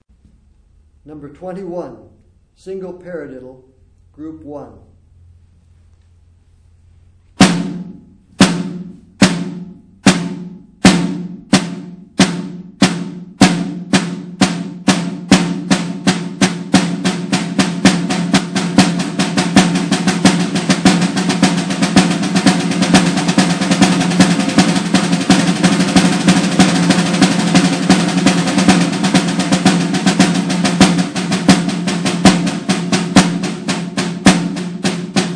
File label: snare rudiment